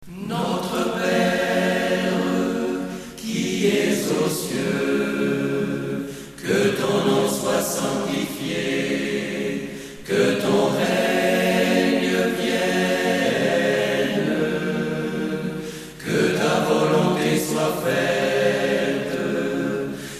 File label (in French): à cappella; Christ est vivant.Alléluia; Notre Père